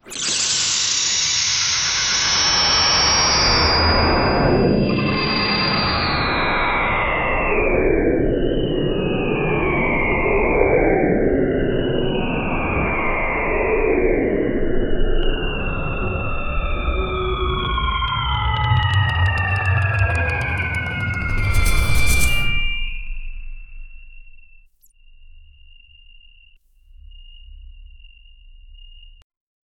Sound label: dark magic spell sound